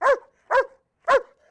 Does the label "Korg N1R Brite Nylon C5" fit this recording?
No